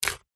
chili pepper chop slice knife wooden chopping board